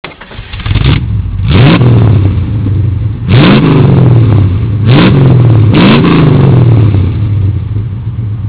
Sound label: v8 baby; odglosy roverkow